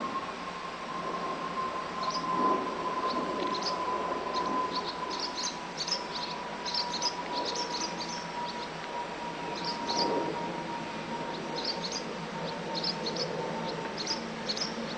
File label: bird